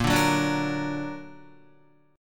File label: Bb Augmented Bb down Guitar; strummed; 4F,4A,5C,5G,5C,6E; JGuitar